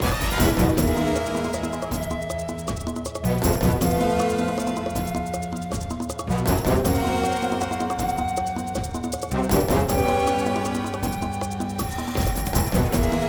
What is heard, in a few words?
Music Ingame Boss Giant Trex Loop; Boss 01